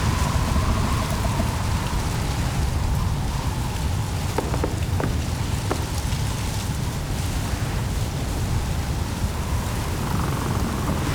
Exterior Foreground Forest Angry Jealous Loop, ambience; Duviri